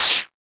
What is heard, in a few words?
Multimedia Internet CD-Rom Flash Window Close